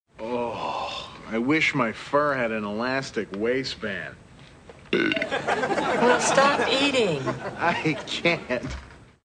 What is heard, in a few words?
burp